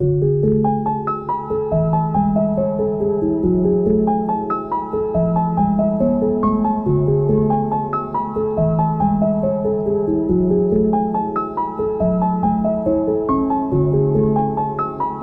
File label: Grand Piano; Nicos Nextbots vol.3 original soundtrack 2023